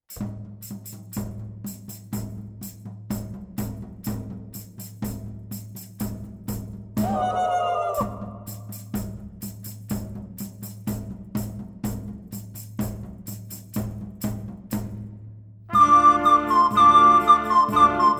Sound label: Rain Dance, blokfluit